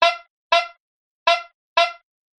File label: Toy Car Honk